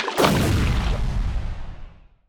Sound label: missed; Quentin C's Gameroom